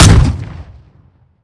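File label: dpapa12 fire player, weapons; gmod; iw8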